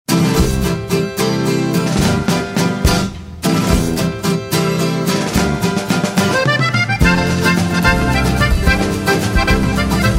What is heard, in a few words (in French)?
chanteur de blues